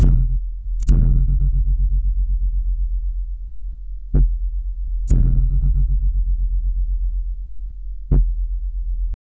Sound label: easy listening and soft a, faraway